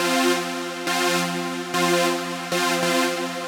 Synthline, 138BPM, F major; Melody & Synth; Vengeance Samples; Another Day Kit

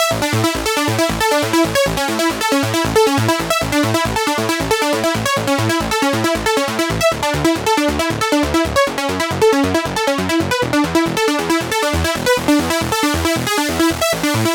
137BPM Main pluck DRY, Amajor; Unlooped

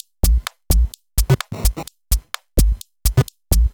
Electro Loop 128 BPM; Vengeance Samples

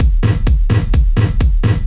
VFX2 Loop 128 BPM

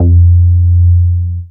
Root F, Bass

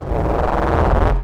FX Scratching; Vengeance Samples

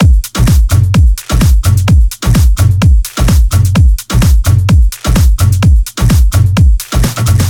128BPM Brazil Kit; Vengeance Samples

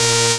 Synths A#; vengeance essential club sounds-3

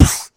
Beatbox Snare, Human Beatbox

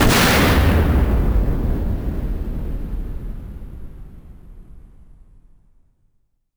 FX Impact; Vengeance Samples

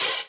Open Hihat; Vengeance Samples; VEE Cymbals

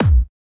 Kick, Trap Foundations Kicks

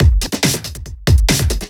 Loops BB 140, BreakBeats; Vengeance Samples

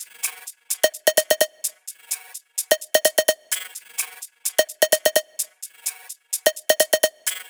128BPM Fragile Kit; Vengeance Samples

